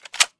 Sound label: scar20 clip in; Counter Strike Model Pack; CS2